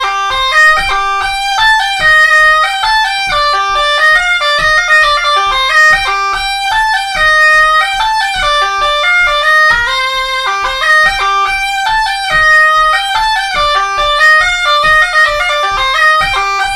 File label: mazurka